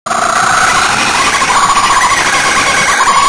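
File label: kookaburra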